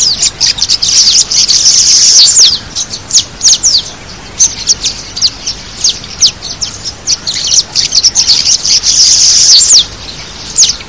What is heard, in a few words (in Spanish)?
golondrina azul chica